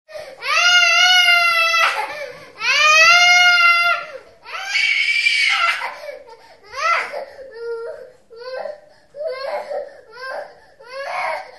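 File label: child crying protest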